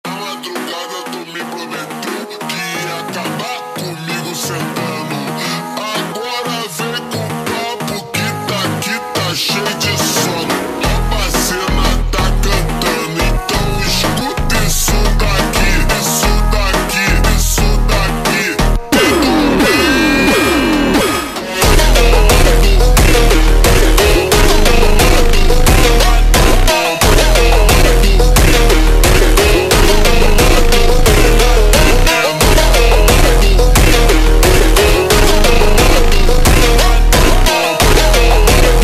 Montagem ultra Slowed

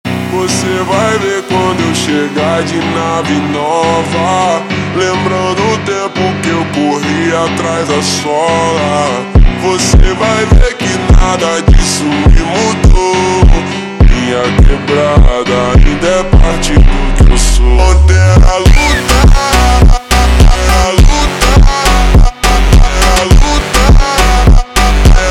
Montagem super Slowed

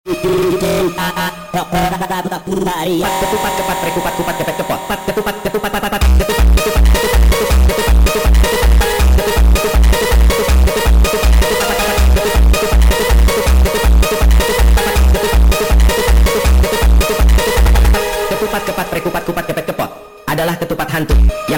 sped Up

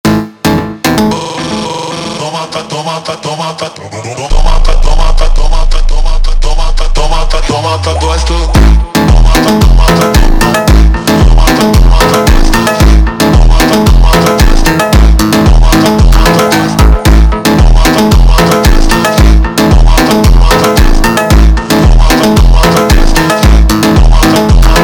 Montagem Slowed

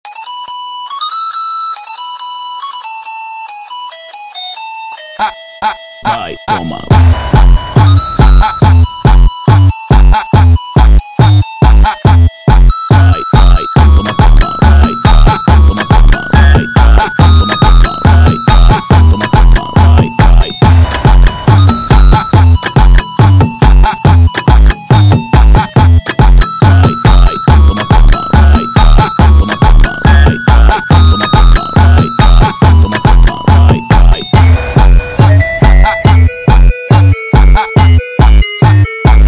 Funk

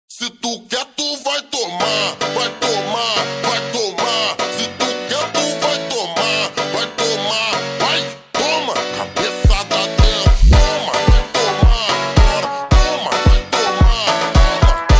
Funk super Slowed

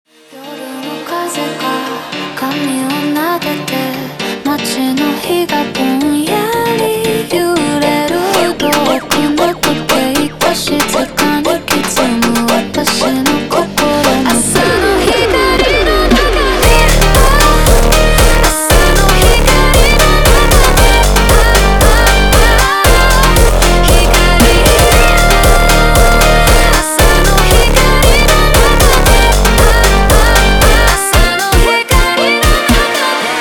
Jumpstyle slowed

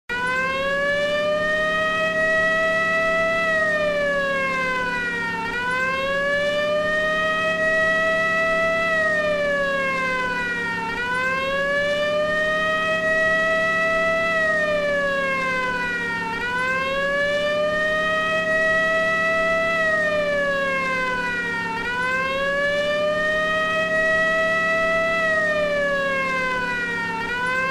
sheriff siren, ringtones; Disney

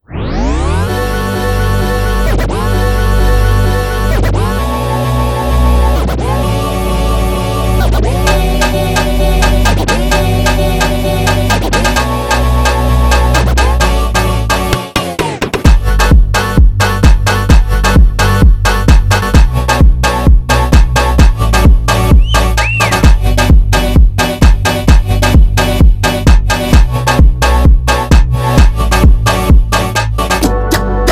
Metadata Funk instrumental

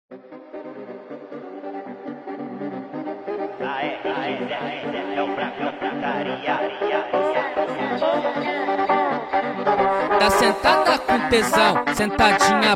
FUNK Sped Up

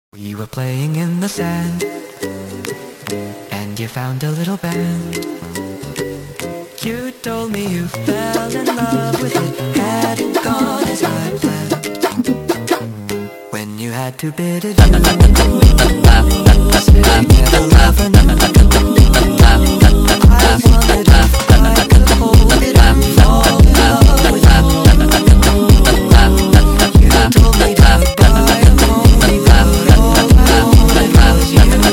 Funk sped Up

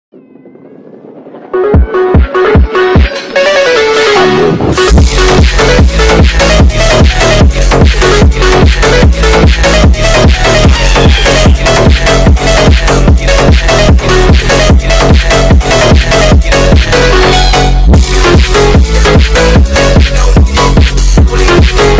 Phonk Remix Sped Up

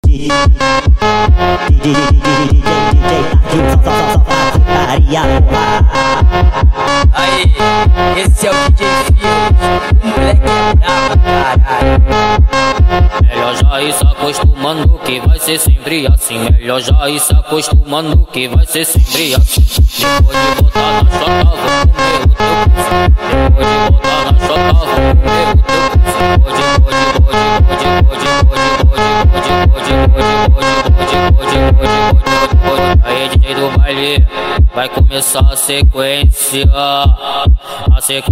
Sped Up

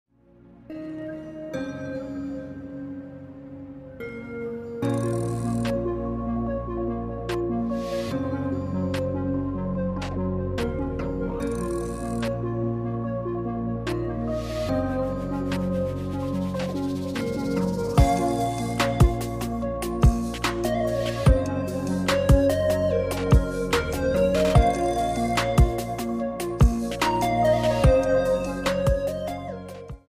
Relax The Dreamy Atmosphere Electronics

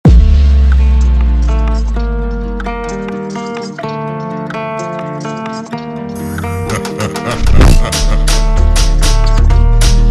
slowed

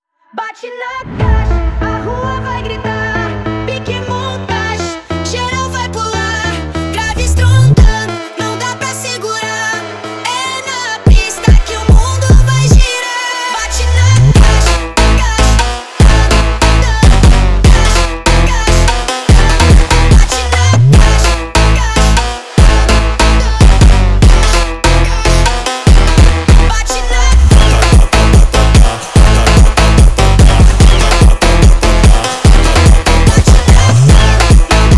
Funk sped Up

